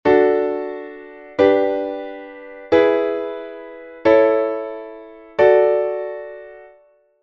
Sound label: progression, secondary dominants